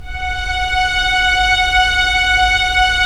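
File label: STR String Section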